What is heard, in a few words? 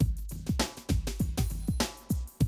drum loop, 100 BPM